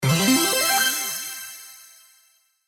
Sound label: UI Roulette Win; Hot Spin; CQ9